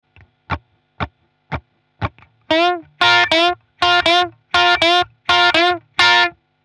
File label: blues, guitar